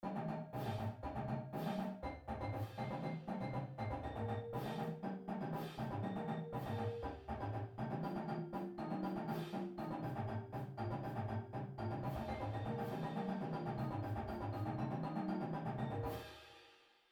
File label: Drumline Exercise, Diddles; Rennick; pdlmusic; Drumline Ensemble Series